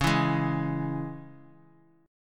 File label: Db Minor; strummed; JGuitar